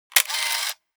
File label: classic camera click